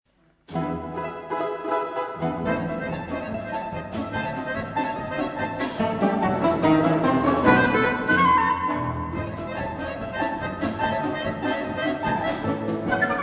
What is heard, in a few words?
American folklorist music, Orchestra Russa; Traditional